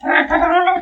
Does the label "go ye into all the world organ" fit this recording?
No